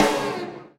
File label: Combo Hit; Bee Movie Game; Trapped - Ken Combo 2 Hit